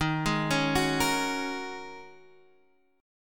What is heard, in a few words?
G sharp 7th Suspended 2nd Suspended 4th D sharp